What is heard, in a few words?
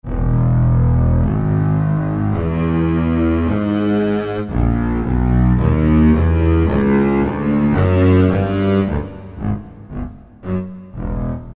Contra Bass; Roland PN-D50-01